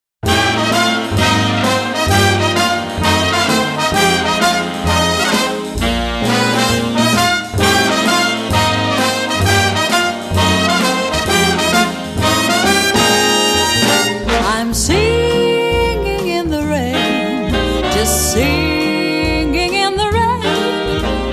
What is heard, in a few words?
swing orchestra